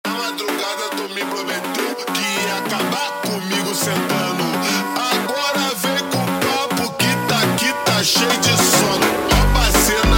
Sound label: Montagem slowed